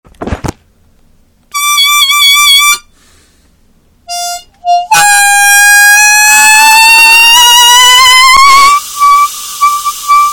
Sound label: semitone, harp